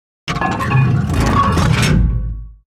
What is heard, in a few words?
gun reload; World of Tanks